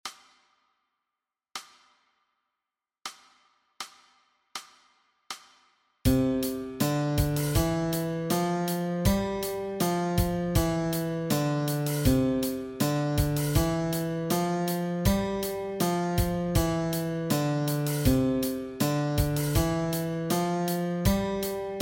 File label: Guitar Exercise